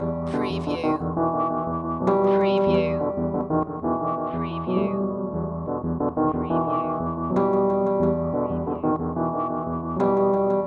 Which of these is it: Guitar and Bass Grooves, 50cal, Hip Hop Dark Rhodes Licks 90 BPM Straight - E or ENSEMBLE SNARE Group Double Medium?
Hip Hop Dark Rhodes Licks 90 BPM Straight - E